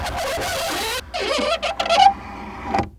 doigt sur vitre

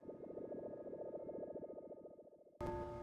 cloche

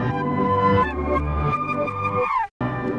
clarinette

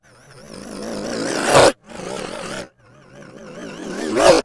attaque chien

